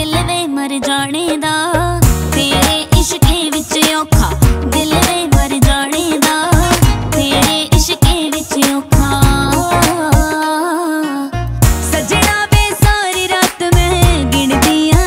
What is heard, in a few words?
Punjabi Song Ringtone